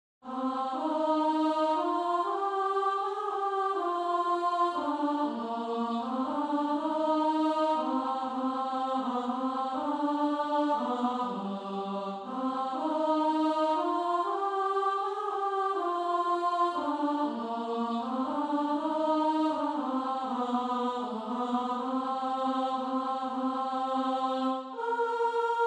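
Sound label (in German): solo mittlere Stimme; Greensleeves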